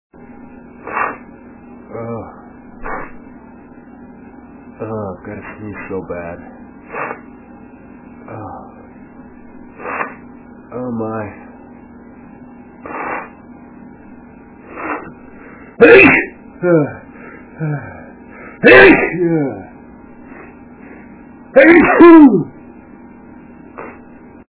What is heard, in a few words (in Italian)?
Sternuto, male